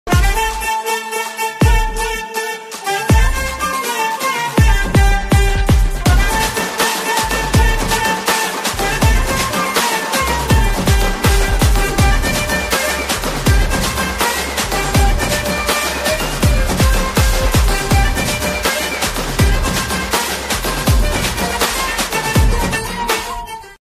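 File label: background music ringtone; the greatest of all time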